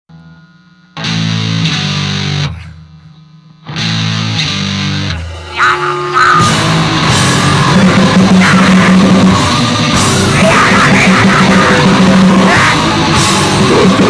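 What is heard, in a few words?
not didgeridoo fight shakuhachi; not didgeridoo fight 尺八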